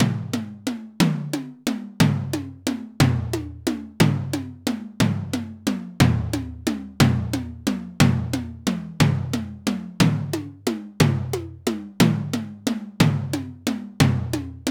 melodic tom

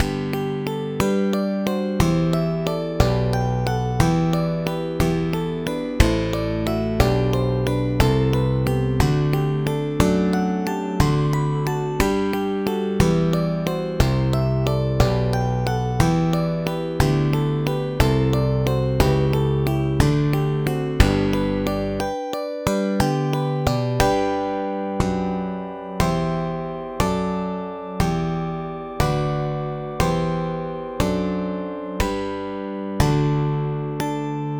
slap bass

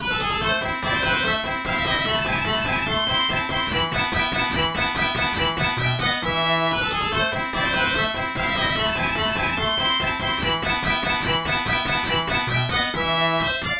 contrabass

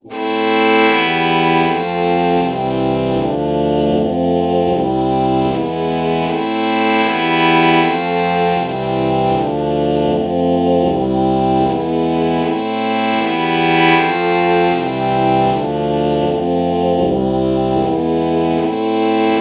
violin lpc false chord morph

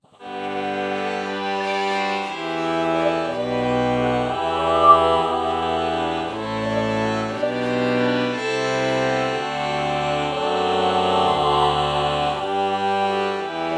ah violin lpc true bach morph

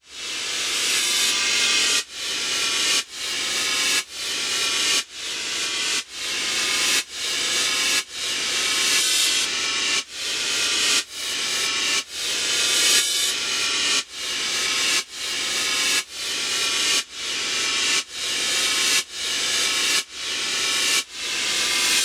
reverse cymbal

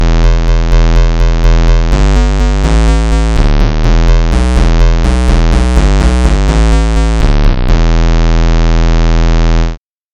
square lead bass

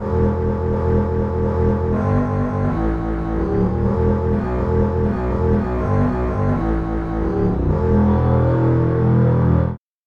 strings bass

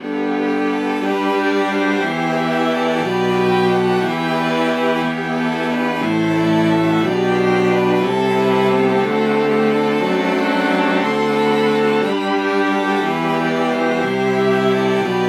viola

